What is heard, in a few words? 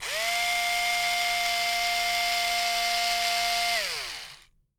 ELECTRIC DRIVER; 1000fx